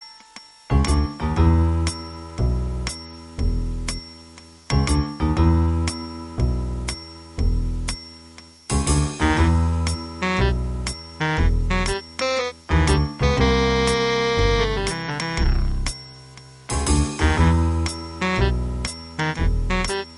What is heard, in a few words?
bgm